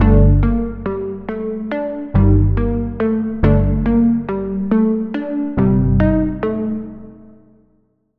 key, Sylenth1; Routed Sound; Sylenth1 Expansion - Street Vanquisher Vol.1

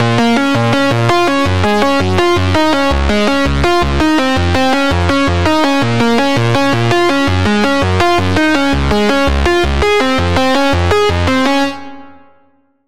Lead - Majesty; Routed Sound; Sylenth1 Expansion - Flame Summoner Vol.1

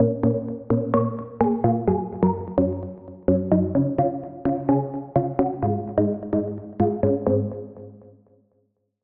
Pluck; Cosmic Rattle; Routed Sound; Vital Expansion - Star Scavenger Vol.1

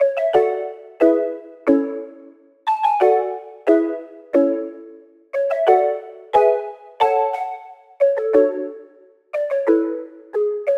Key, Sylenth1; Key - Crystal Castle; Routed Sound; Sylenth1 Expansion - Story Walker Vol.1

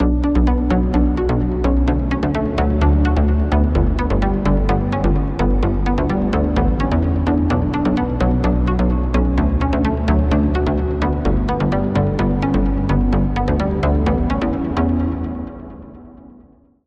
Key

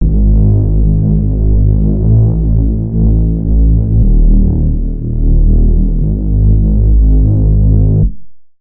Reese; Damage; Routed Sound; Vital Expansion - Noble Gangster Vol.1

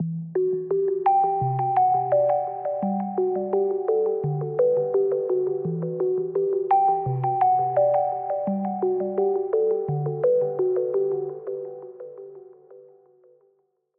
Key

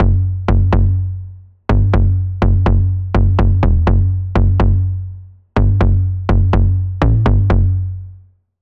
Bass, Sylenth1; Bass - Bad Education; Routed Sound; Sylenth1 Expansion - Noble Gangster Vol.1

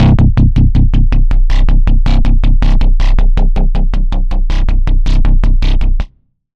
Pulse, Serum 2; Pulse - Car Chase; Routed Sound; Serum 2 Expansion - Quantum Enforcer Vol.1

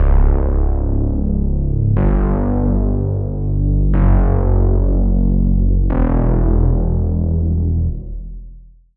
Reese; Routed Sound; Serum 2 Expansion - Noble Gangster Vol.1

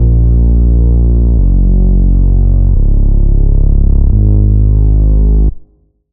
Bass; Routed Sound; Spire Expansion - Flame Summoner Vol.1

